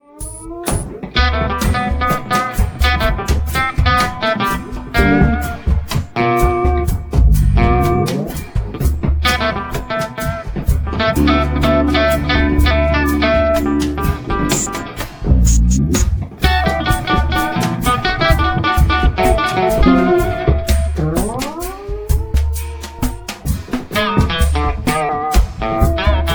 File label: tribal